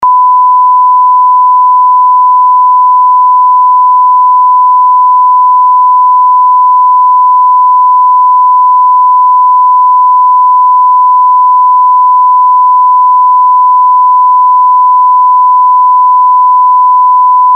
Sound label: 1kHz, test tones; test tones to help set up the amplifier in your system